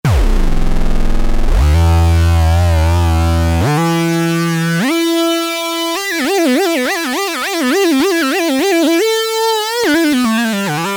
R&R Guitar, Prophet-5; Sequential Circuits Prophet-5 (Ver.3); Factory Patch; 41 The Nuge R&R Guitar; File 3 Programs

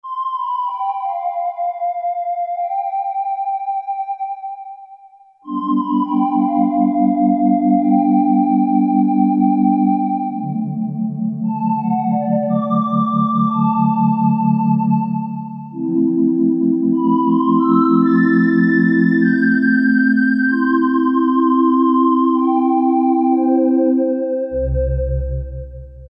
ROUND PAD; Akai SG01v

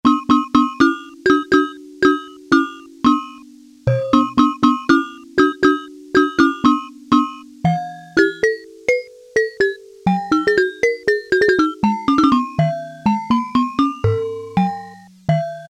GAMELAN, Casio Rapman; Tones

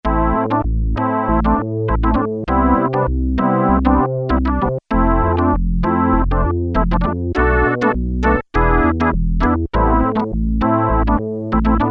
Organ I, Roland Juno-106; Factory Preset

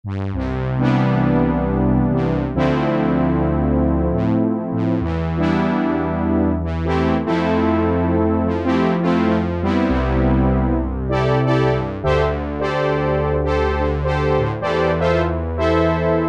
BRASS ENSEMBLE, Oberheim OB-8; Factory Patches

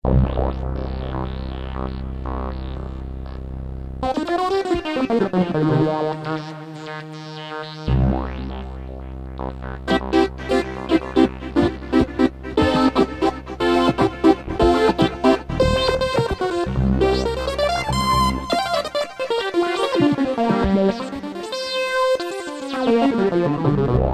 Clock S&H Lead, Kurzweil K2000; Kurzweil K2000 V3

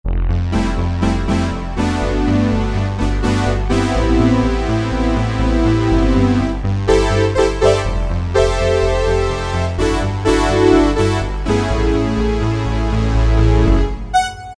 Atmosphere 2, Roland U-220; Factory Timbres

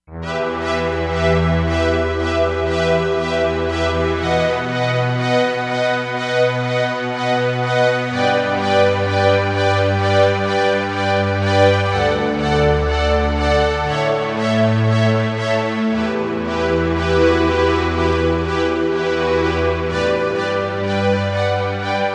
Sympulse, Korg MSC-07S Synth

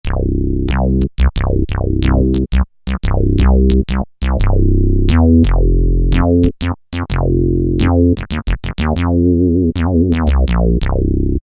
Bass, Korg MS2000; Factory Patches

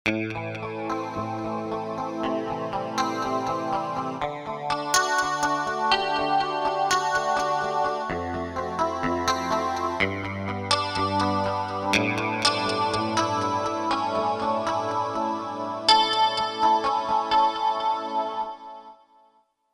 Clean Strat; Factory Patches

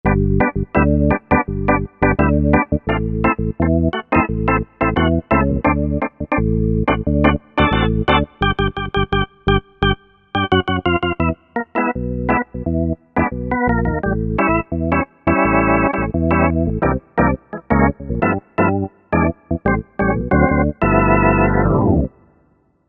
Medium Trem, Organ

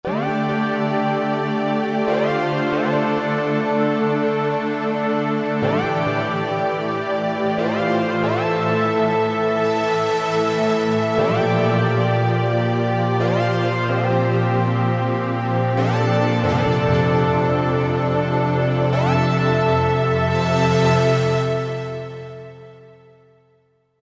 Mega 5ths, Roland SR-JV80-04 Vintage Synth

